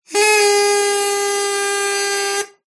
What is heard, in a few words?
Party Horn